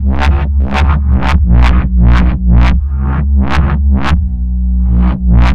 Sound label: 173 Bass, Drum n Bass